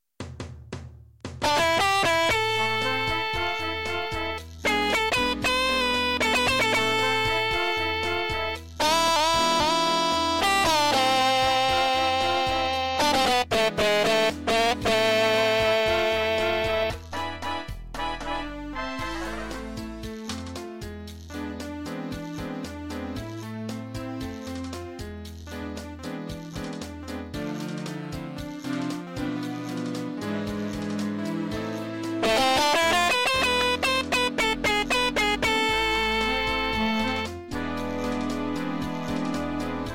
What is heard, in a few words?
Smooth big band